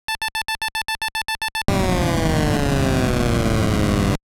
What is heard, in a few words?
Game Over, Famicom; Turbotime; Rookie!